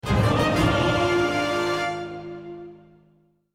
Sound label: Item Get